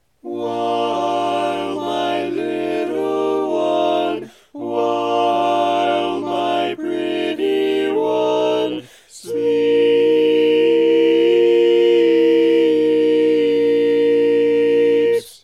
Tenor, barbershop

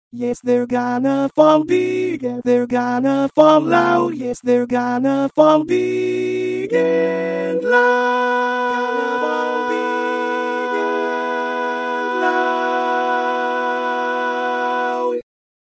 tenor

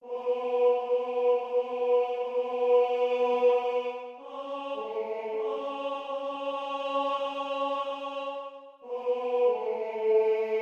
Tenor, barbershop tags

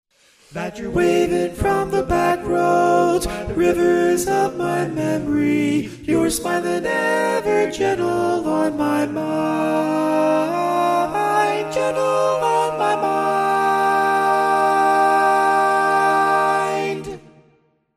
Tenor, barbershop tag

